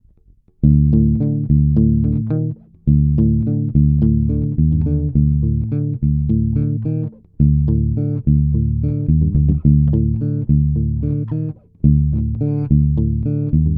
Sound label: Bridge active mute